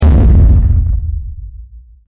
low boom

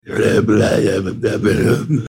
zombie brabbles

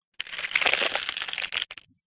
frozen crackling sou

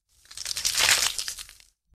egg hatching

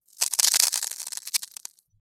frozen crackling sou

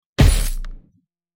mechanical robot

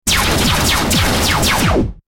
laser battle

